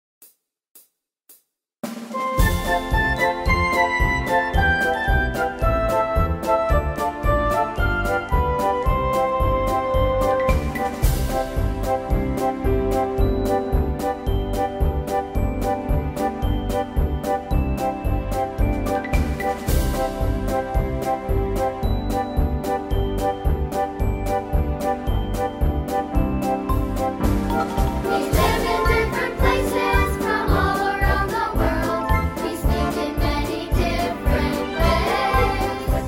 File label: Hello to all the Children verse only vocals